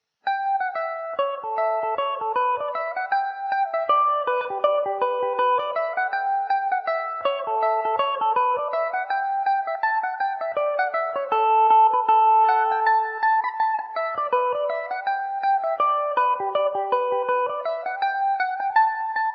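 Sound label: A mixolydian, Irish mandolin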